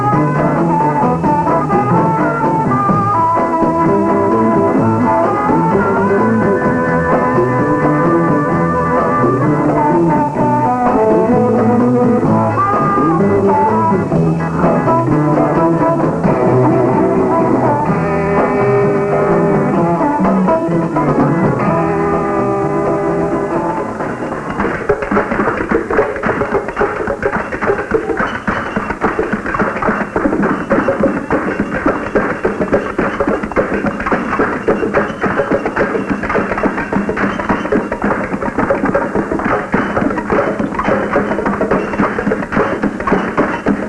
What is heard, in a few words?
music